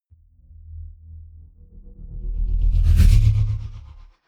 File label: sci-fi vehicle drive by tonal flutter; raumschiff